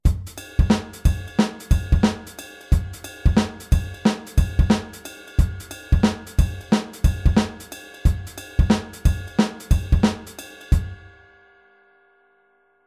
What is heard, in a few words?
Groove off; shuffle offbeat